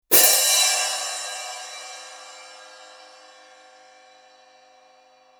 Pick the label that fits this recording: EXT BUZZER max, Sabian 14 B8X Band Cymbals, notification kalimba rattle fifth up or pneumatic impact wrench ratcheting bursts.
Sabian 14 B8X Band Cymbals